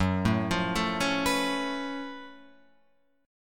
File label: Gb Minor Major 11th Gb down Guitar Open F; GbmM11 arpeggiated; JGuitar